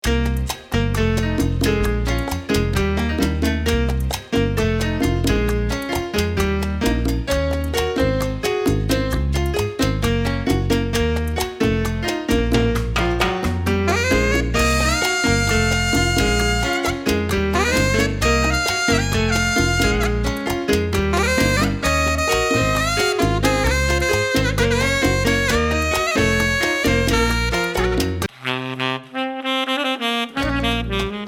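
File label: Medley salsa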